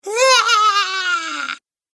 Scream Witch; Screaming Sound Effects

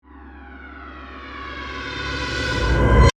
Ramp Flange; Production Element; Ascends (Sound Effects:Sound Design); BlastWave

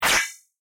Chime Digital; Chimes; Multimedia; BlastWave

